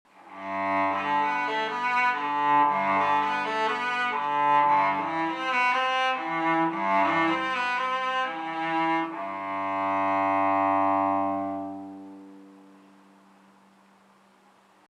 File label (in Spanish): violoncelo